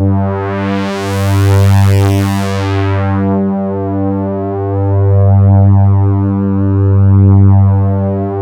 full analog; Sound Gallery - Charged

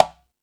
Latin Drums, E-MU Pro-Cussion; drum machine

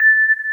Electric Pianos; Wizoo Electric Pianos

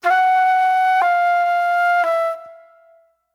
Soul Plate Flute

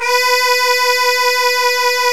MIDJWIZ C2; Midjwiz & Shaku

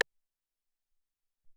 Tek Rim PP, Alesis SR-18

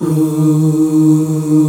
VOICE OOH, Voice Oohs; E-MU Producer Series Vol. 2 – More Studio Essentials; Composer

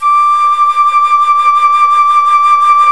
FLT FLUTE, Flt vib; Roland L-CDX-03 Disk 1

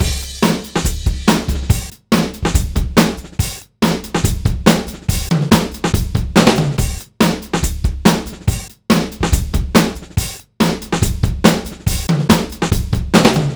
Beat Loops, 141; Zero-G; Monster Beats

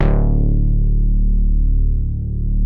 D1 BOOGY BAS, NY SYNTH; Northstar - New Gold VOL-2

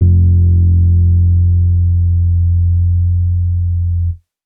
Dusty Analog Bass F, Bass Guitar; 99Sounds; Monosounds; Music Loops; Instrument Oneshots

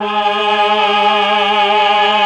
CHOR, Propeller Island Legendary M400

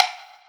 Wood, Block Stick; Equipped Music; Loungin House

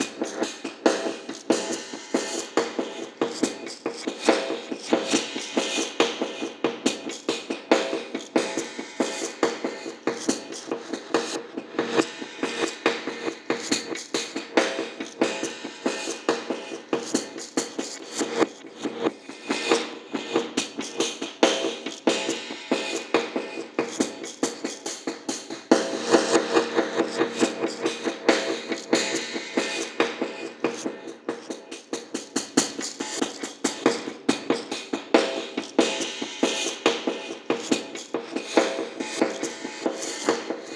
Beat, Drums, Eclectic Electronica

